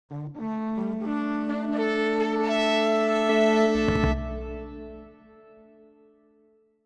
Winjingle Good victory; Half Sword

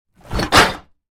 Trash

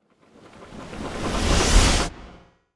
Geyser Warning

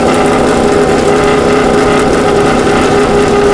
Car; ABC Sports Indy Racing; Lidlehi

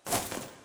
Vase Angelwings Flap

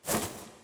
Vase Angelwings Flap

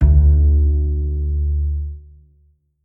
Bass Note; Bendy: Lone Wolf

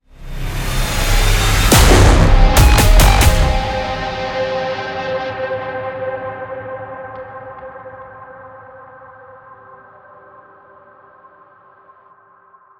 Boss 2 Ease Out; Voidbreaker; ps5 windows xbox series xs

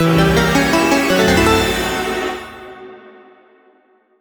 Jingle Fantasy; RPG Maker Fes Master Rips; 3DS

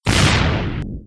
Robot Explode; Agent P Strikes Back

